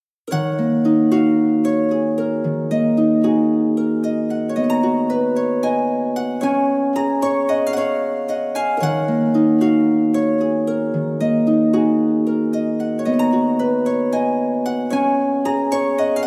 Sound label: Harp Only; PeriTune Material